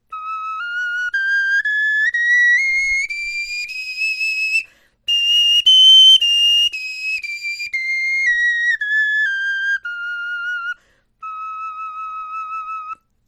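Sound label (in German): tonleiter, Knochenflöten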